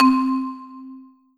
Alesis S4 Plus Ster Marimb C4

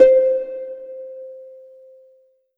Korg 01W Harp C5

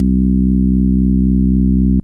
Korg DS 8 Organ C4

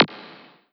Korg N1R Zap

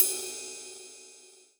Roland SC 88 Ride Cymbal

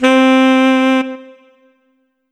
Kawai K5000W Alto Sax C4

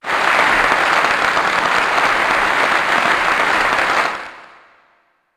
Kawai K5000W Applause

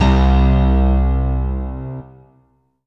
Korg M3R Ready 2 Rock C2

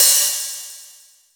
Open Hi Hat

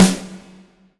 Korg N1R Snare Drum

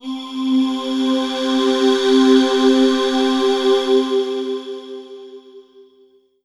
Yamaha FS1r Breathy 5th C4

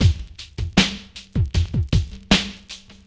Roland SR-JV80 Bacon 78bpm

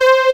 Casio CTK-611 Chiff Lead C5

